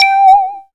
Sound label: SYN TON